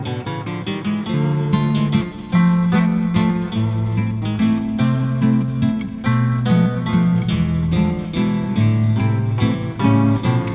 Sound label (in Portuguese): Valsa; 7invasores